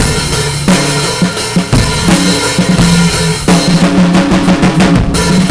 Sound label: Rock 87 bpm, breaks; Zero-G Beneath the Planet of the Breaks